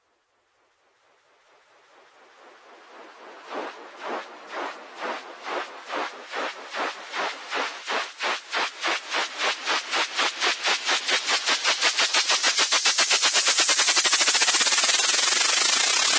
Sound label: FX Essentials White Noise Riser 100 BPM; Cymatics